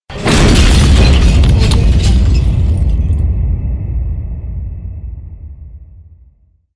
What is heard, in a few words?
artillery